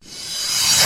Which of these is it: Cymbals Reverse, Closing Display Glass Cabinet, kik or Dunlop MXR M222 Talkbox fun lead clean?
Cymbals Reverse